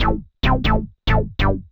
SNTH BASS ELCTR 140 A, Techno

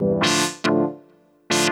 TECHNO SYNTH 140; Keys

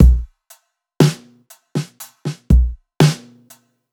Classic Break, Loops

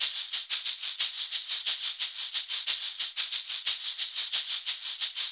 ETHNO EXTRA 90; ethno2

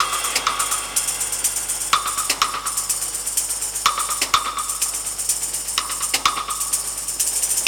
125 Classic Breaks, 125

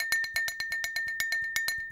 bottle, ethno, 125

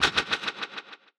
Hat

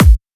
kick, drum hits; Anthemic

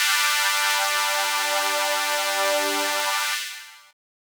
ELECTRO PAD 140 A; Keys

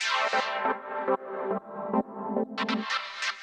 TECHNO 140; Techno2

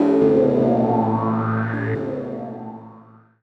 KEY SHOT TEKNO 140 A; Techno2; Singleshots

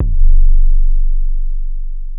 C1 Tuned Kick; Touch Loops; ElementsOne; Abstract Rhythms

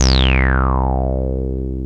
TB BASS; TB-303

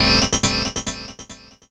KEY SHOT TEKNO 140 A; Techno2; Singleshots

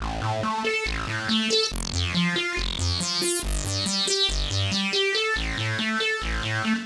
synth tekno 140 A; Techno2; Keys

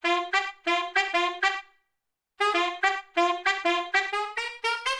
OLDSCHOOL BRASS 96

